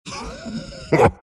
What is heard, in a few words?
laugh, centaur; dota